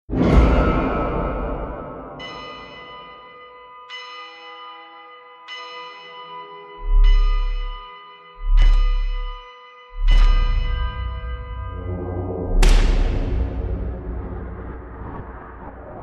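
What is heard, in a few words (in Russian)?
Страшные звуки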